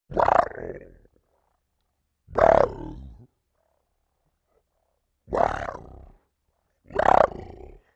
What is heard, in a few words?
Deep Growl